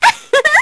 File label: laugh; twewy; uzuki